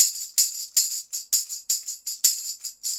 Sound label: tambs 80, latin, 80bpm